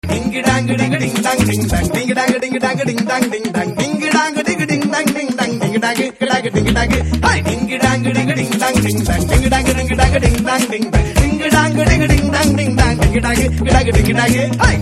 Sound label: Ding Dong Ding Dong Ringtone